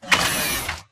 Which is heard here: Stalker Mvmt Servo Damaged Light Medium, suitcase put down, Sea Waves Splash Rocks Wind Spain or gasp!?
Stalker Mvmt Servo Damaged Light Medium